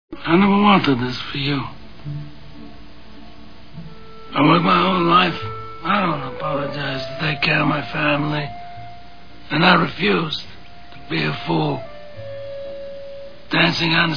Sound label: strings